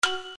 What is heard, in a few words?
button generic